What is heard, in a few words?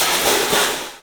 CYFX, EFFECT CYMB; 3D Sound; 3D Drums